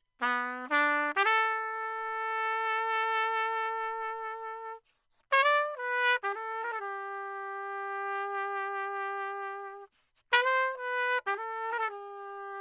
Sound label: trumpet; lewitt